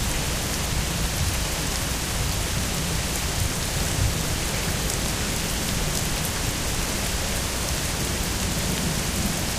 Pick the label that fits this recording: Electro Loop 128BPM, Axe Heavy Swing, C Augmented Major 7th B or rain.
rain